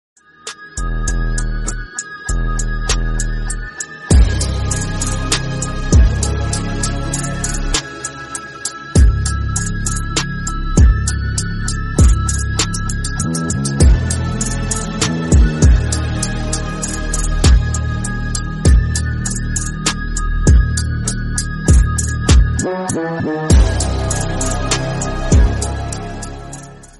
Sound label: theme instrumental; loki universe; loki green theme